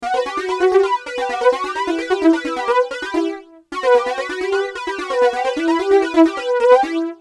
fast, Fender flanger; x5dr

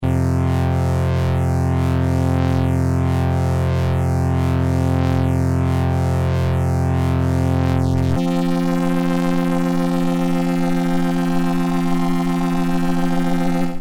prodigy voce spin

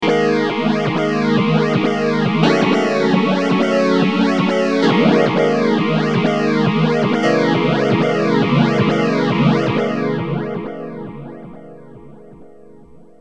m1000 phase

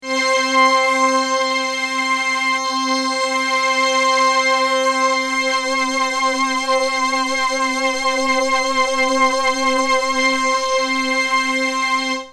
voce spin

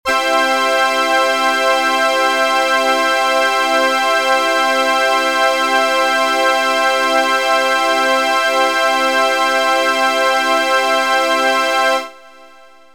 lambda dry

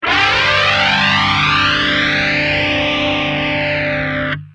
gtr mxr fd

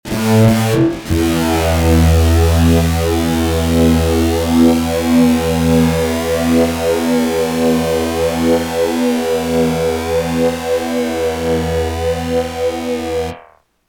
fuzz gtr polyflange

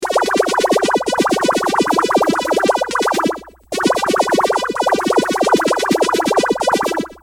phaser

